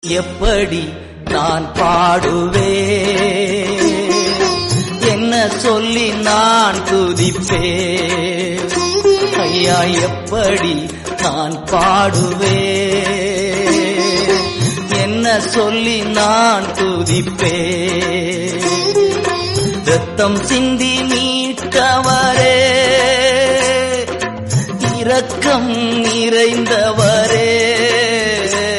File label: Tamil Christian Song